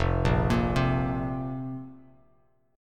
F Suspended 4th F, Bass Standard; Fsus4 arpeggiated; 1,1,8,5; JGuitar

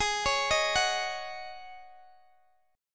Ab 7th Suspended 4th Ab down Guitar DADGAD

